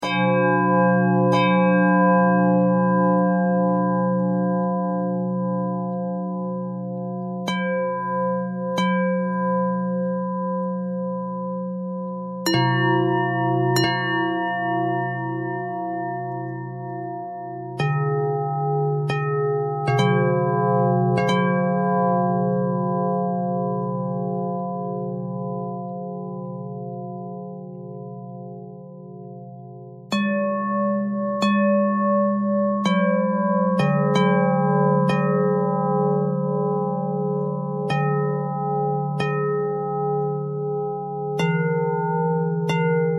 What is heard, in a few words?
bell bell thread noise, bells